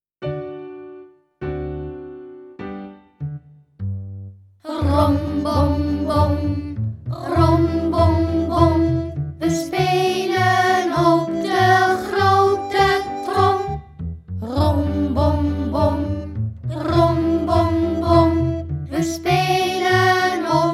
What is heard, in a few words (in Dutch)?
op de trommel; instrument